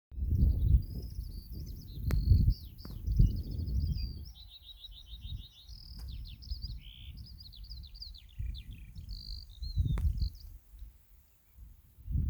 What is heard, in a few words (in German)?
Lerche